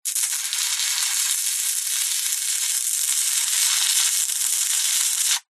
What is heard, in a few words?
industrial spark electricity surge